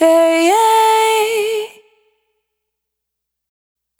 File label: Urban, Single Voice Melodic Loops, Vocal Samples; Pocket Diva World Class Vocal Samples